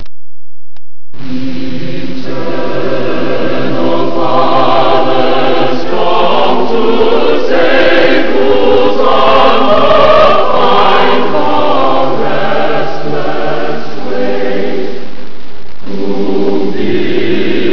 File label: eternal father vocal